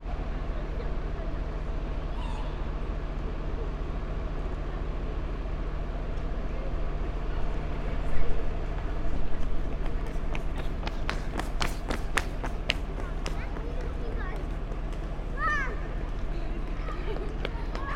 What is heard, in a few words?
ambience urban town centre quiet; sound effects one